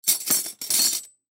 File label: Silverware Set Down, Silverware Set Hit Metal Movement Silverware; Blastwave